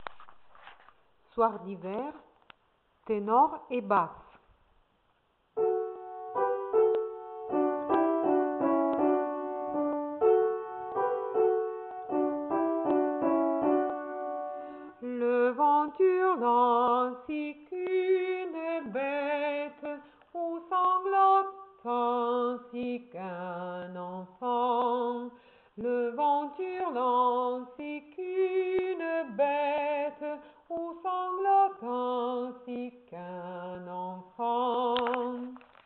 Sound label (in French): soir d hiver tenor bas